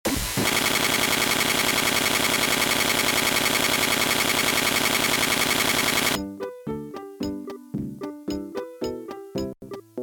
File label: melody